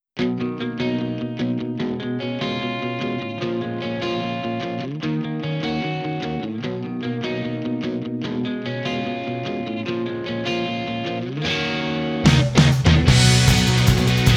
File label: Instrumental; Gintama